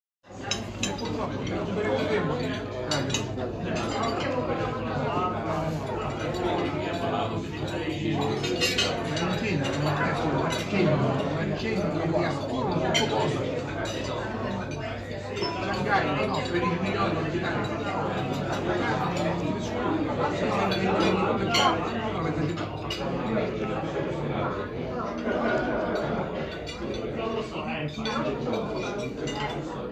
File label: Restaurant